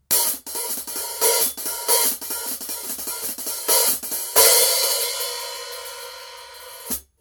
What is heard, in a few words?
zildjian